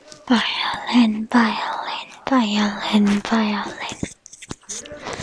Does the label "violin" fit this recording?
Yes